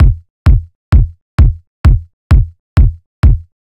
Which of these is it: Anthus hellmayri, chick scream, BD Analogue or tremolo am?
BD Analogue